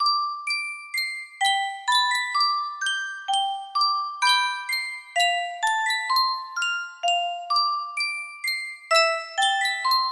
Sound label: yunsheng music box, music box melody